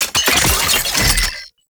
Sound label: Scan Pillar Plant Open; DE; Warframe; Warframes; Frumentarius; ScanAbility